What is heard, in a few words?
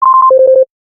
Normal Ring